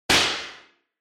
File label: Hit Slap Long; 打撃 平手打ち